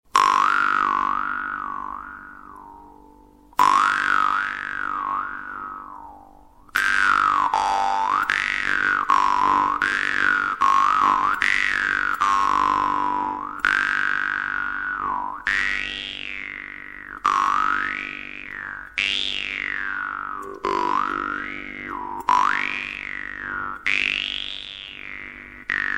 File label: vargan; russian vargan sklemin strela